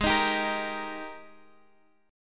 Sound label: Ab Major 7th Suspended 2nd Ab down Guitar Half Step Down